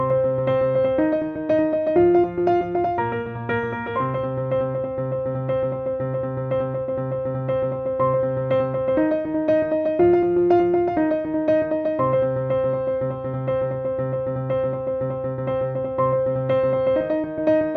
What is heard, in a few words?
Paradiddle Exercise